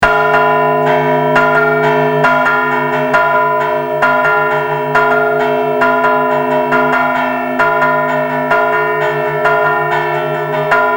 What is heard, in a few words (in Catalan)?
campana